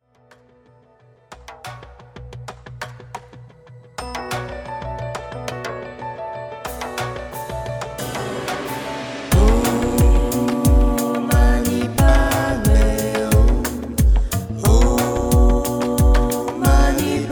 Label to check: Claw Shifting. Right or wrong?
Wrong